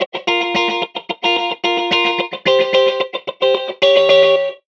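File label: funk guitar mix, ensemble reverb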